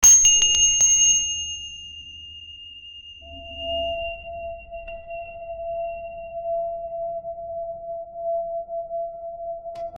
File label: ding sound, alerts